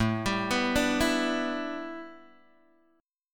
A 7th Suspended 2nd Suspended 4th A, Guitar Open G; chord